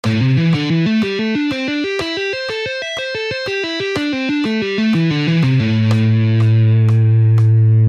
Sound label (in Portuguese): Exercício tapping